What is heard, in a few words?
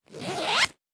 Zipper; Survival Game Sounds - Lite Edition